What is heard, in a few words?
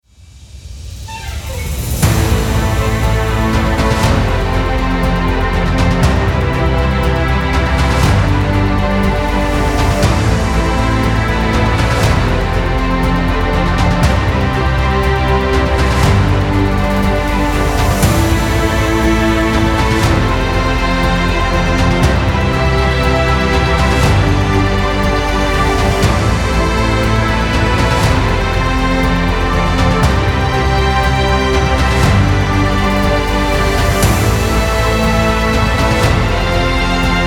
Epic Adventure